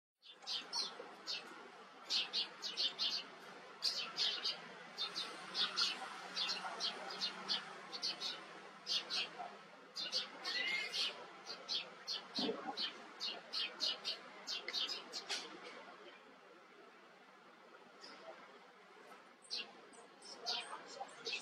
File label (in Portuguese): canto do palmchat